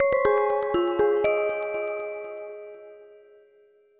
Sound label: Ring